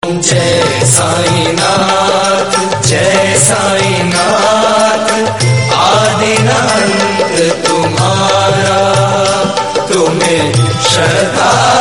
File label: Sai Baba tunes, Devotional Ringtones